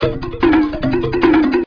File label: ind break lo, drums